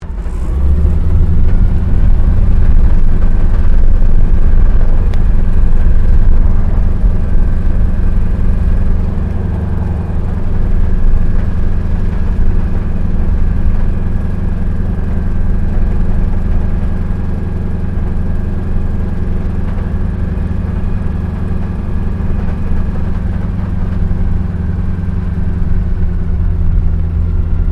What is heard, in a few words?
AIRCRAFT AT-6D HARVARD MK III TAXI SHUTDOWN INTERIOR; Harvard Training Aricraft; Airplanes; Vehicles